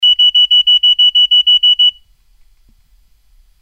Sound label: electronic